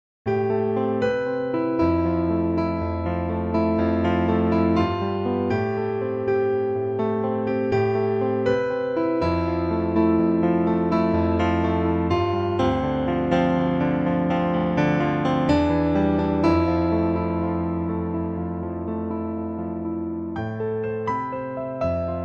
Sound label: PIANOTEQ